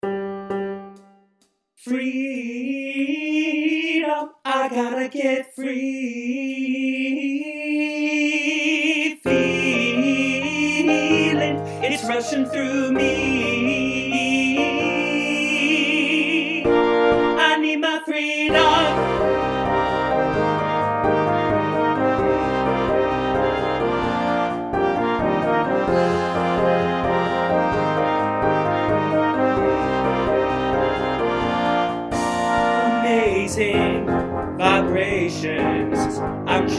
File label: audition tenor